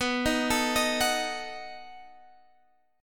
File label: B 7th B down Guitar Standard